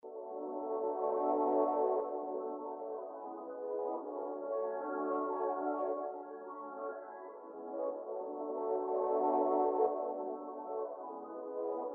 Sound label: RnB Melody Loop Fmin 122 BPM Pad